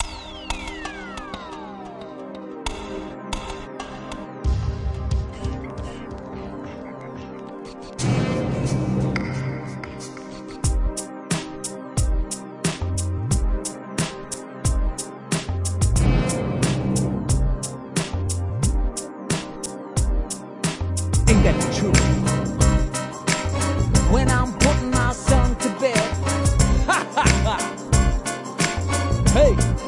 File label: Solo